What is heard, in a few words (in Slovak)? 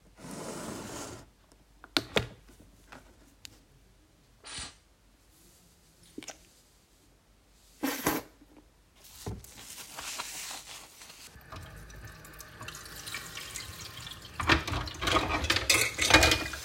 umyvanie riadu